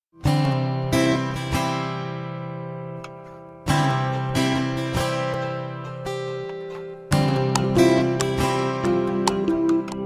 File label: Instrument; Nayakan